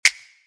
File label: Snaps Edm